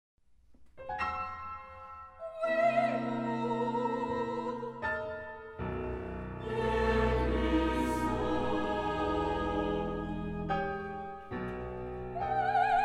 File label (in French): Choeur final